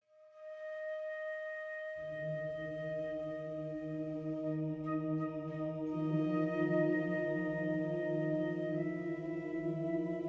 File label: Tension; Jurassic World Evolution 2; PS4, PS5, Windows, Xbox One, Xbox Series X/S